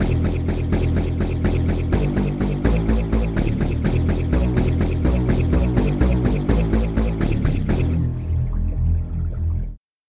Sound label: synth brass bass